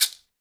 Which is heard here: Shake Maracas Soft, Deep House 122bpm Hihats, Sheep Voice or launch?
Shake Maracas Soft